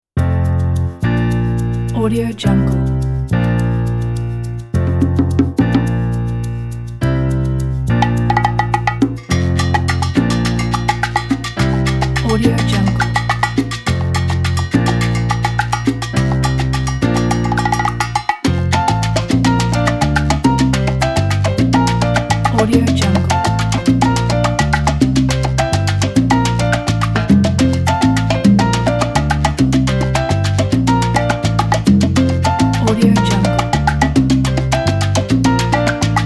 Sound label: cafe del campo